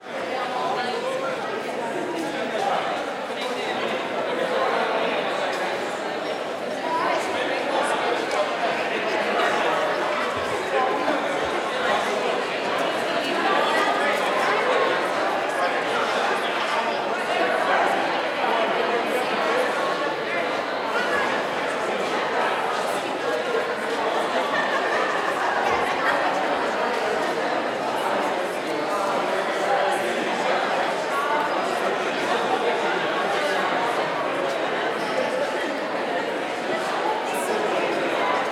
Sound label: Crowded Opera Lobby